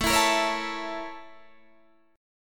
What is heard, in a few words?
A sharp Minor Major 7th Flat 5th A